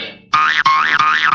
Funny Spring

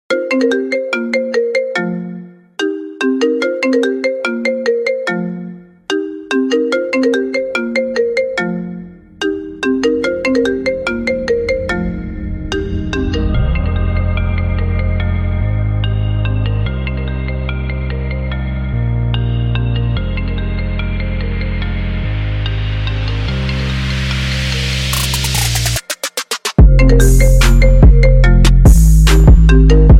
iPhone trap remix

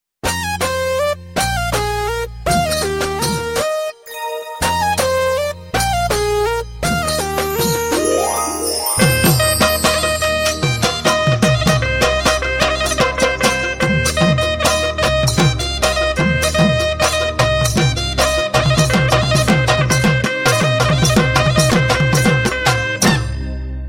Nokia In Bihu Style

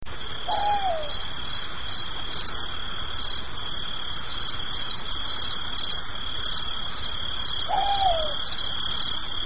Grilo cricket

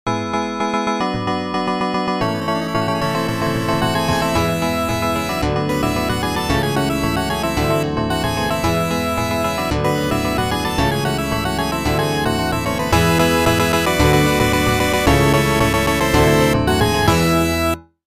8 Bit; We Bare Bears

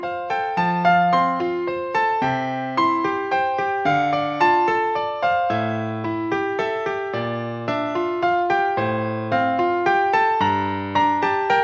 Cute Piano

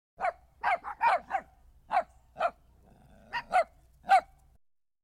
Dogs

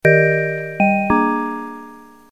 Jingle; Denmark DSB

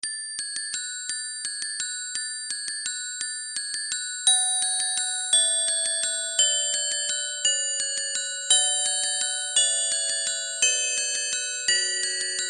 Christmas Bells

